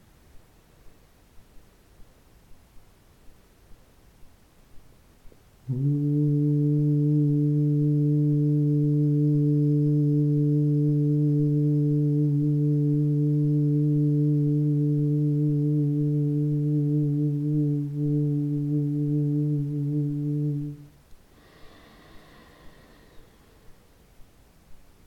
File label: Chakra tune Manipura